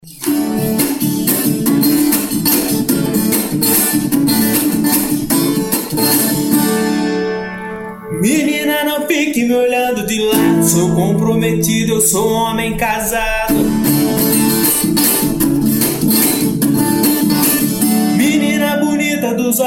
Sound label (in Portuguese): viola teste